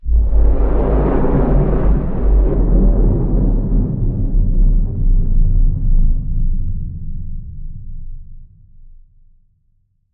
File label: Fly By Far Rumble